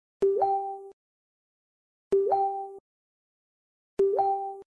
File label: new facebook message sound